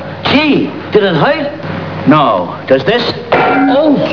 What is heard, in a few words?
hurt